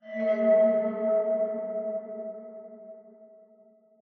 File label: Diving Music Bowed; The Sinking City